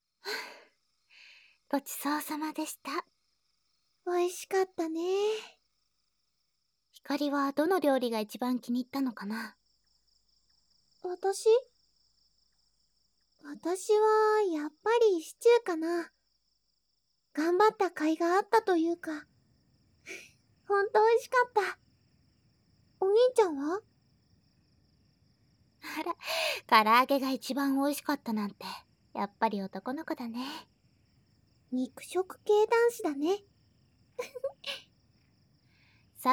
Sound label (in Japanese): 左右から姉妹の耳かき (環境音有り)